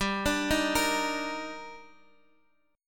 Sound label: Eb Major 7th G